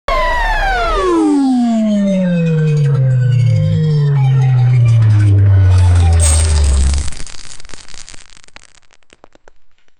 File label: fireball